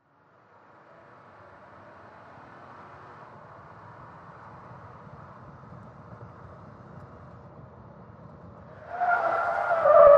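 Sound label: SUV Truck 180 Skid Mix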